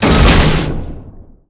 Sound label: torpedo; World Of Warships; weapons